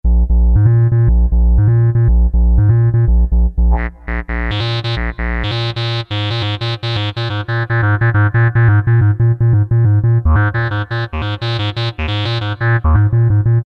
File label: Sqr MW, Alesis QS8; Factory Preset examples